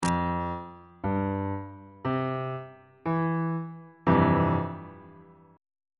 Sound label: f major 7th suspended 2nd chord on bass clef